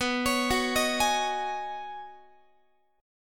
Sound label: B 6th Add 9th B down Guitar; B6add9 arpeggiated; 4A,4E,5A,5D,5G#,6C#; chord shape; JGuitar